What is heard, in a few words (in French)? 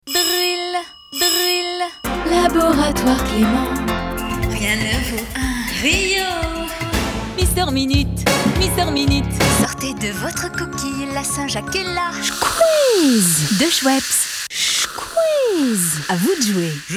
CHANT Medley